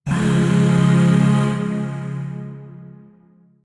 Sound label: Void Shawzin Phrygian Chord; Warframe; Zariman; Chords